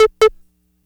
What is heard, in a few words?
Blips; SFX; Patterns; Electronic Point Blank